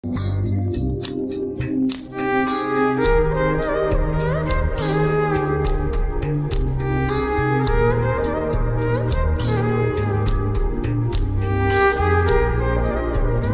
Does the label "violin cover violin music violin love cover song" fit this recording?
Yes